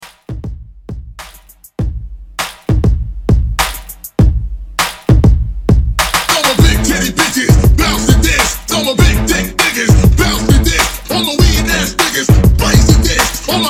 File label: Hype 100Bpm